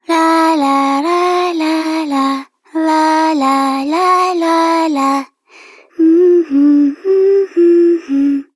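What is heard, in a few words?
Child Rhymes Hum, Child Female; Duviri; Childrens Rhymes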